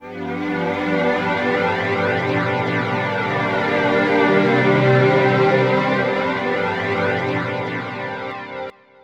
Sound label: Kloudy Paddz G3, Lounge Atmospherez